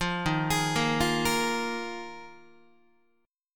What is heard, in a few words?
Eb Suspended 2nd Suspended 4th, Guitar; Ebsus2sus4 arpeggiated; JGuitar